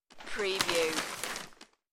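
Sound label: Newspaper Open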